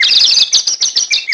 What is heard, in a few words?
Medli Dizzy; Medli dizzy after crashing